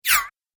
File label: Arcade Game Chirp Whoosh; Video Game Sound Effects; Retro Inspired Sound Effects - Think Nintendo, Atari, C64